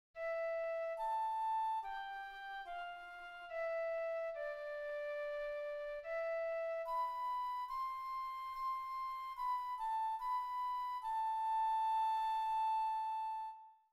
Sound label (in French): FLUTE